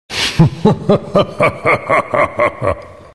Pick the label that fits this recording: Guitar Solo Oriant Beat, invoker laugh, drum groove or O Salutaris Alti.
invoker laugh